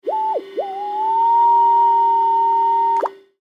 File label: Meridian Scanner, Meridian Scanner Digital Readout Warp; Hospital; Emergency